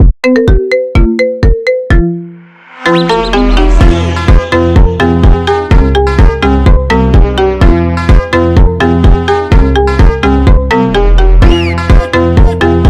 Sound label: phonk marimba ringtone